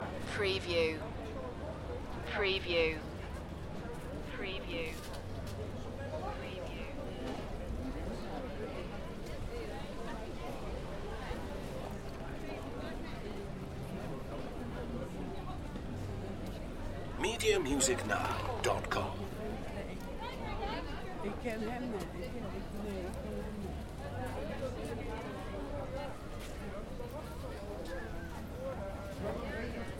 pedestrian street crowd

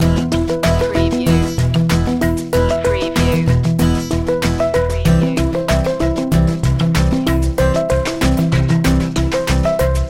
acustech loop

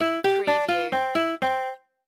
Casino Electronic Sound Game Over

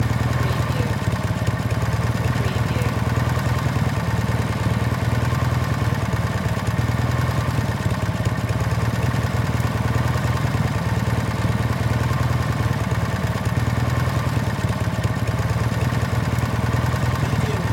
Motorcycle Idle Loop

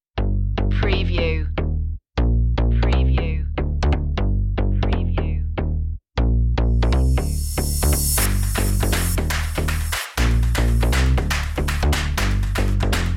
latin groove; media music now

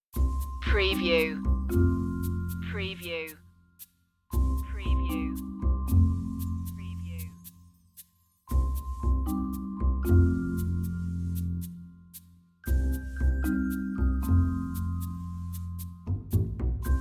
no strings and piano

